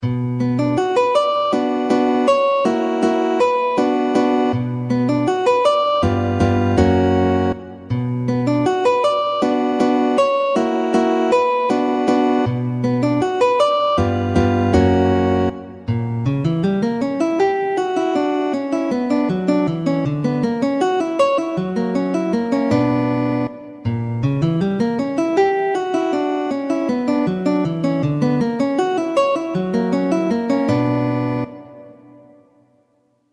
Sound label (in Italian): valses, guitar